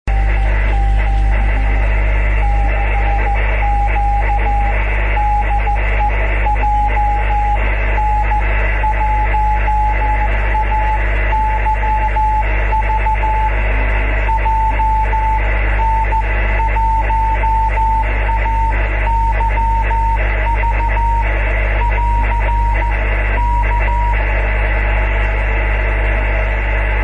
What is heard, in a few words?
5G beacon; 茅ヶ崎市 富士山; 戸田市; 伝播距離：162km; 弱雨、気温1２℃ 湿度８0%; SSB:RST599; ANT方向: 富士山